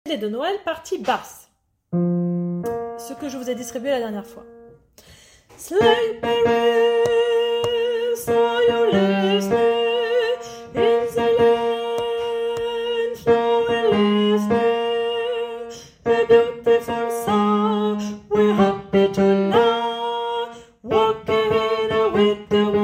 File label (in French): basse; Debut medley de Noel